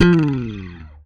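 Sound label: slap, bass; Xsample VOL-1; SLAP+THUMB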